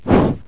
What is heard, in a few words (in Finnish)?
puf